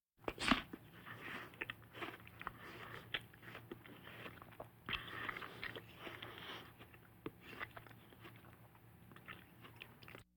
Vocal effects breathing Moaning Baby

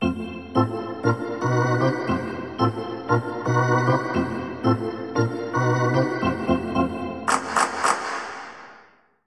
Hockey Organ

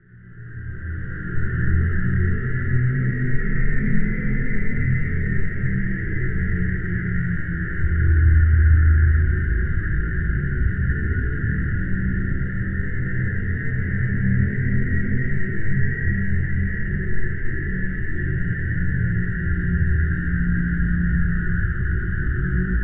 Amb Scifi Energy; Energy & Forcefields

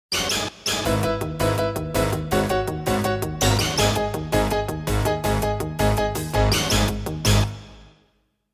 Jingles; 90 DDD Jingles

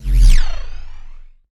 Scifi Synth Tone Disable; Energy & Forcefields

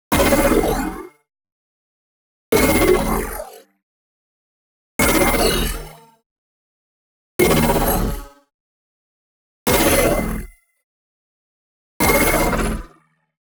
HIT SMALL Hologram; NEON; boom library

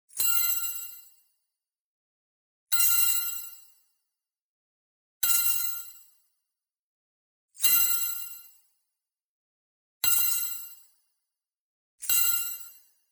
BLING High Light Tonal Ping; boom library; Anime Essentials

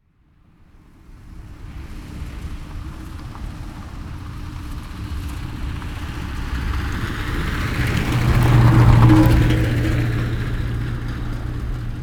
car stones, street traffic; best service Blue Box

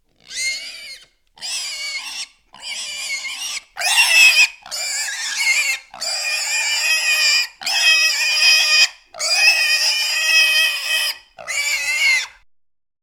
pig; Inquisitor 2

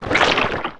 Squish Grab Guts; SFX Kit; Foley - Misc

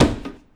Hit Kick Plastic Cooler; SFX Kit; Foley - Misc

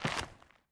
Footsteps; Hero; Blind Survivor

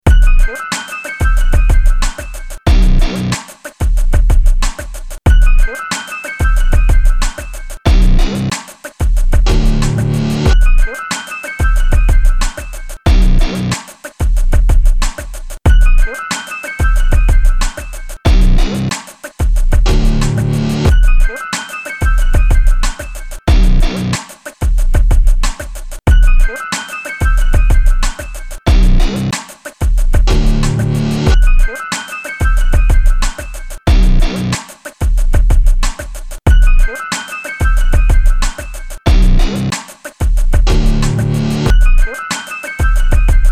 Electro Hard; Beds Loops; RoosterFX

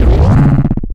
Menu Scifi

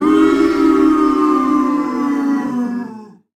Crowd Boo; Pitch Black Audio Pong

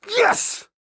police hit good short; donnie; Blind Drive

